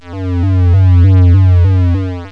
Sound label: Oscilating Electronic Tone; KABOOM! - Wild and Wacky Sound Software; Weird